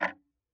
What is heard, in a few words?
Tick, Clocks Kitchen Clock